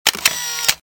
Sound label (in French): Appareil photo